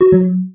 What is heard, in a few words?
Low Time